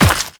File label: Run Dirt, Footsteps; Warframe; The New War; Characters; Kahl